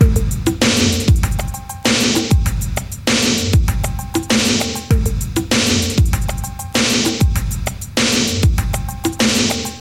98 bpm drum groove A sharp key, kick tuned to the A# note